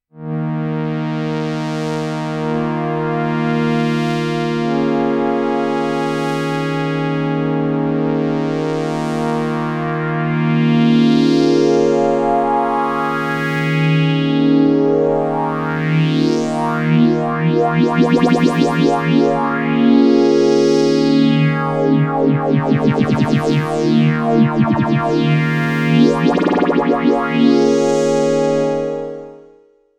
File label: Morphing Fifth; Korg; Whirl